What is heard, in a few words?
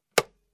Switch